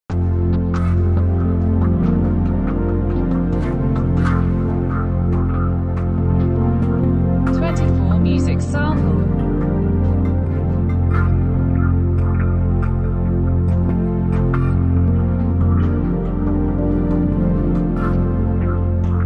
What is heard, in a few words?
little things synth samples